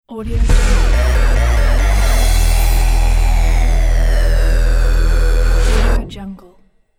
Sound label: sinister evil and dark logo; garsumene